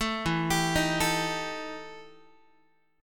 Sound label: E Minor Major 7th Double Flat 5th E Guitar; arpeggiated; JGuitar